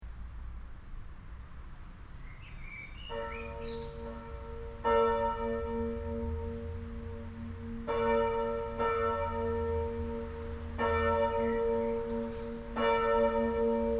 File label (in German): glocken, kirche; martin-luther-kirche alsterdorf